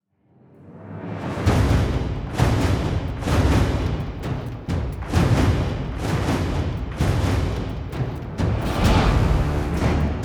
Bossfight Loop; Toussaint

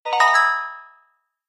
New Suit Notification; Doodle Jump 2

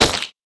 Meat Explosion

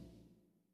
Loop Tail; Good Job

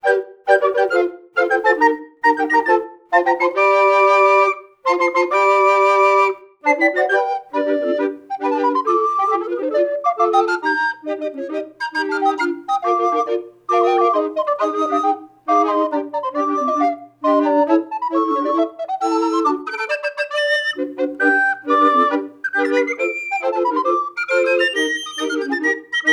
Recorder; legend of zelda the links awakening 2019 switch

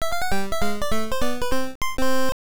Round Clear MUSIC; Dig Dug; NES

